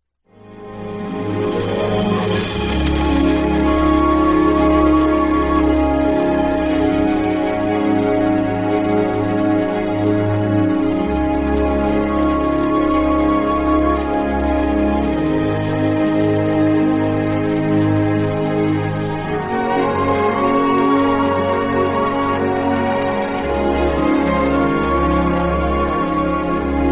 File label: Instrumental